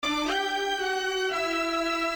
Strings

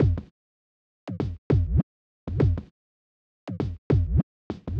kicks; Multitrack

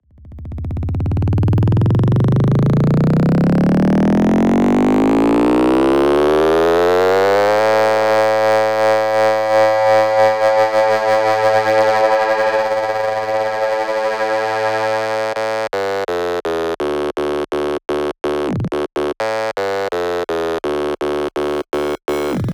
Bass

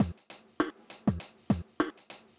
drums

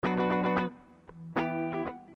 Guitar

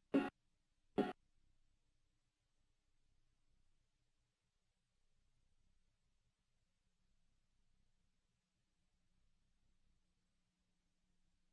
Vocals Additional Instruments; Multitrack

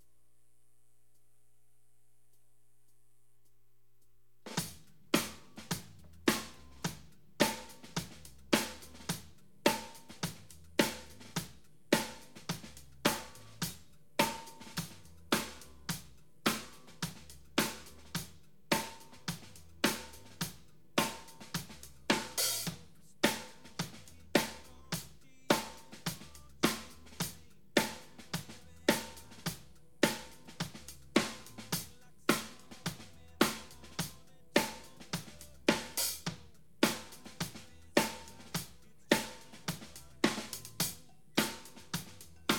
drums

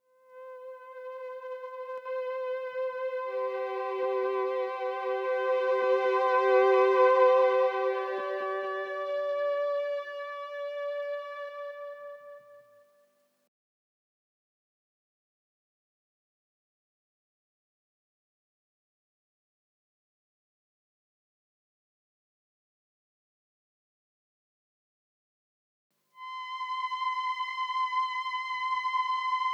Wave Strings

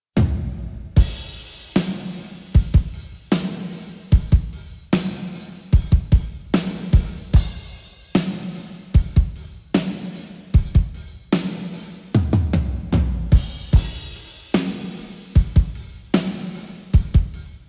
drums

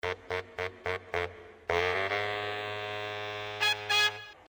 Horns

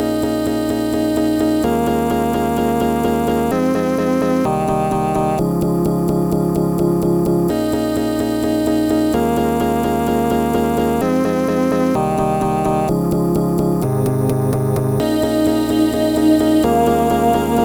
Vox FX Chord Cuts

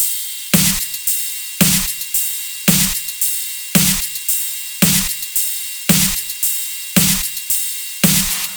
112, Discostep Drum Loops; Rocker2

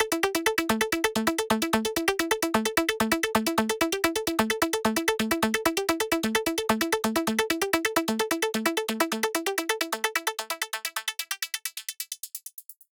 Arp

